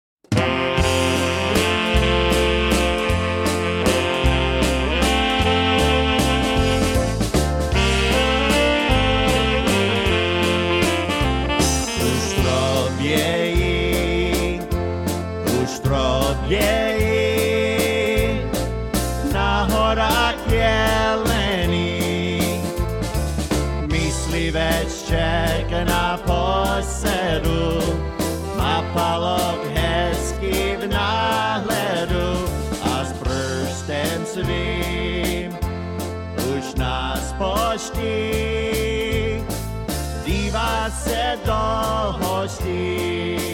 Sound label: Waltz